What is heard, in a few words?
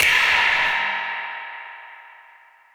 ambient crash sound f key